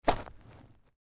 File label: footsteps gravel